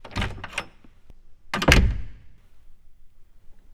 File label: Door Heavy Open Close; Home & Office; Sony Pictures Sound Effects Series Volumes 1-10; Sony Creative Software